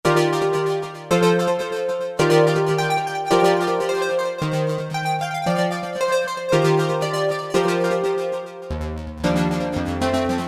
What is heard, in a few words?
PAN SEQUENCE, Roland SC-880; Factory Patches